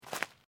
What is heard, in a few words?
FOOTSTEPS SNEAKERS UNDERBRUSH STEP